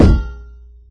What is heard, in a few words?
metal canister impact soft, physics metal; source engine; left 4 dead 2